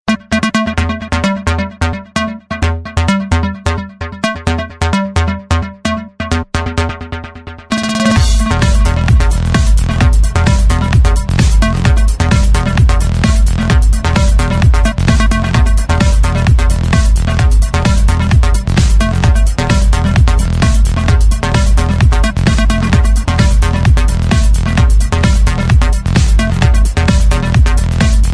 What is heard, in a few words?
new dj ringtone